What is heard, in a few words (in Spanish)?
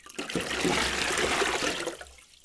cantaro